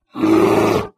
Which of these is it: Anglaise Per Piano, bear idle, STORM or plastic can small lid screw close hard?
bear idle